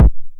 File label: 909 BD Tape Sat